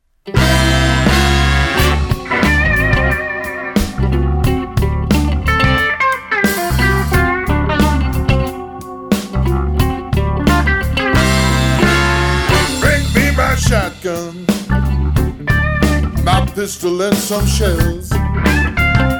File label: Shotgun Blues Sample, mandolin blues